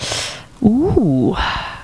ooh

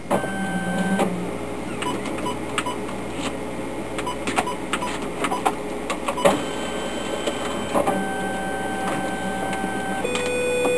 FAX

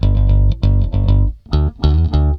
BASS, ROCK POP; MAGIX

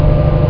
LIFT; JOHNNY

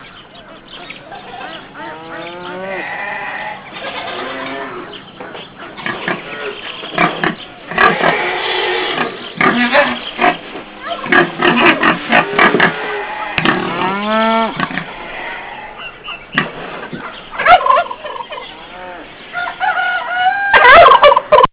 BARNYARD

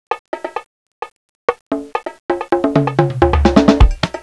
BONGO; 999wavs

